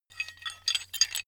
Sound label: metal handling pieces